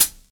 Studio Hihat Sound A Key, Royality free closed hi hat sound tuned to the A note